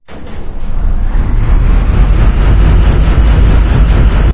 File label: large fan loop start; Source Engine; Portal 2